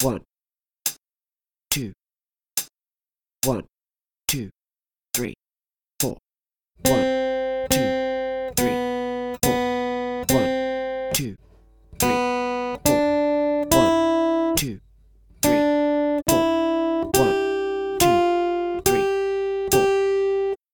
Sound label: 3 strings, guitar